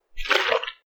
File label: Discard Blood Button